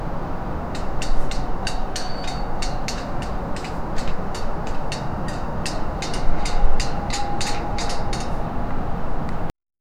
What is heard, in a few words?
daytime town ambience